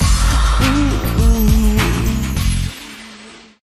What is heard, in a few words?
freespin init; Amatic; Casanova